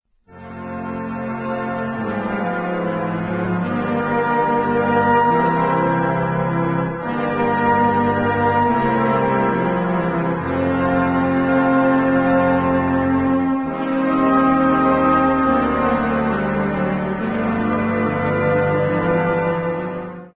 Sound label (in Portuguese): musica triste; chaves